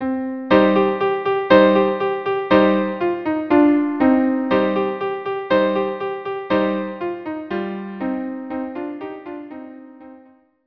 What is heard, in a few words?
violin duo; O Hanukkah